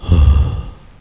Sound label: die; mordor